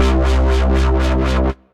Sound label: Low Grunge Wobble, Bass; Synth